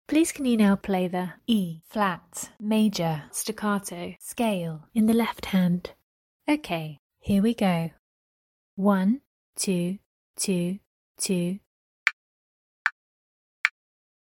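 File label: E flat major staccato scale left hand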